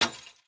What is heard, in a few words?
glass break; Blind Drive